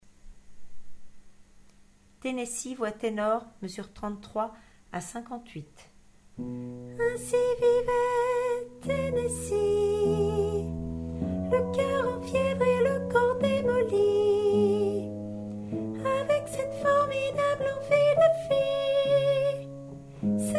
tenor

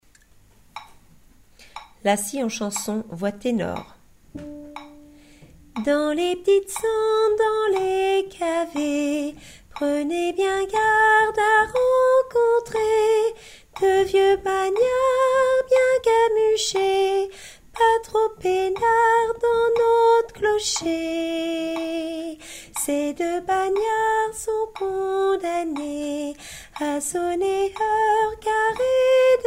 la scie en chansons tenor

